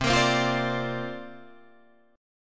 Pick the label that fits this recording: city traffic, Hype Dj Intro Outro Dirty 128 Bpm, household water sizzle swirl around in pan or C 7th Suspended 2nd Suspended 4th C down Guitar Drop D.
C 7th Suspended 2nd Suspended 4th C down Guitar Drop D